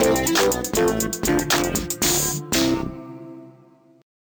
Transition

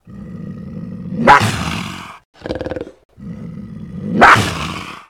Cougar Snarl Growl; enemies

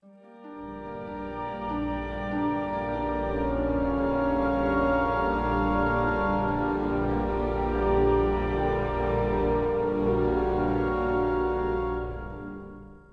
castle music

